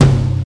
low mid tom sample c key, Royality free tom single hit tuned to the C note.